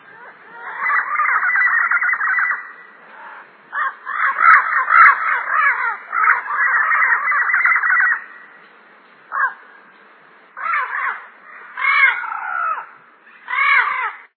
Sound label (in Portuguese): papagaio-verdadeiro